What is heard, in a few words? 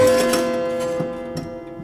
Prepared Piano; Battery 3 Sample Kits